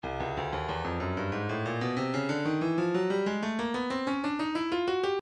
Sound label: percussion congas 093 congas; Stylus